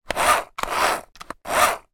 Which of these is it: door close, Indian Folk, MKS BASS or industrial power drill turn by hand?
industrial power drill turn by hand